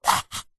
foley container plastic display case small lid slide open; zapsplat